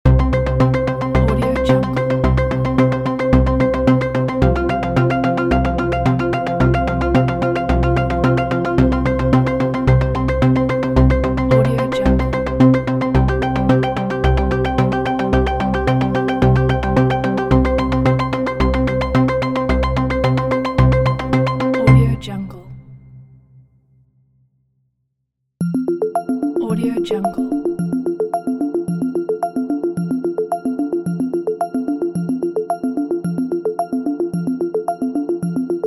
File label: quiz thinking